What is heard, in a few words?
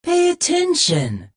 negative vocalization; Adachi